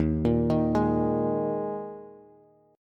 Eb Suspended 4th, Bass; Ebsus4 arpeggiated; JGuitar

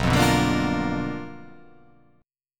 B Minor Major 7th Flat 5th, chord

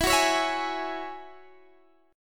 Ab 7th Suspended 4th Sharp 5th E

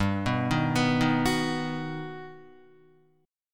G Augmented Major 7th, Guitar; G+M7 arpeggiated; JGuitar

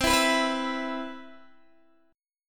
C Major 7th, Guitar Open D

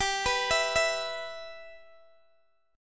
G 6th, Guitar, Open D

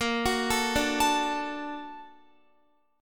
Bb Augmented Major 7th Bb, Guitar; Bb+M7; arpeggiated; x,13,16,14,14,16

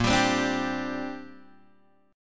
Bb 6th Add 9th Bb Guitar Open G

